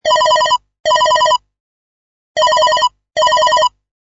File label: Ringtone; W510 Twiggy